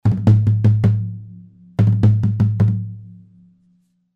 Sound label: pearl forum tom 13